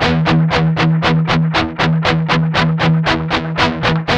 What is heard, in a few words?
8TH F 2, Guitars; Spectrasonics; Hans Zimmer Guitars VOL-1; 128-JACKHAMR